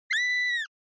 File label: Horror Scream High Dry